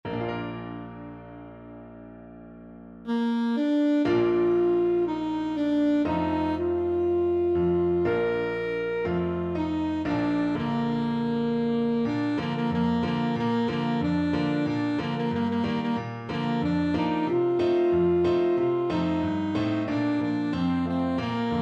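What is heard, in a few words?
alto saxophone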